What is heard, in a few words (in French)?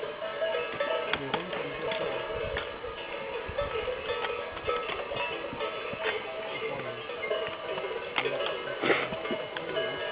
cloches; Parcigoule; Un paysage bucolique et mélodieux; Col de Serre Vernet; 1808m